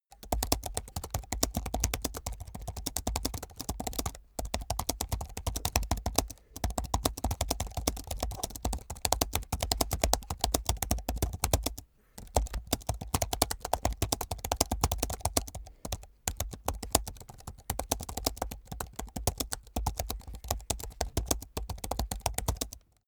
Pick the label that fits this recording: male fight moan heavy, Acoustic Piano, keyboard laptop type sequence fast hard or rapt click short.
keyboard laptop type sequence fast hard